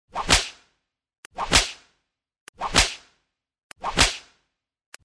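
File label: whip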